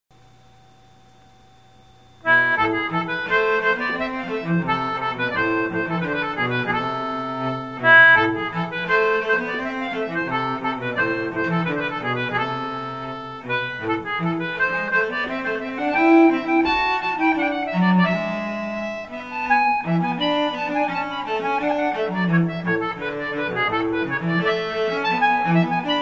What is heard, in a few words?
Swedish, concertina